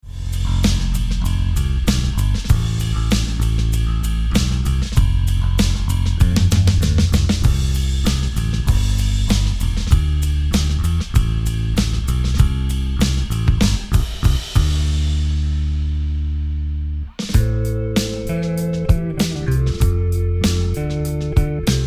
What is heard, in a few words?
no Vocals Guitar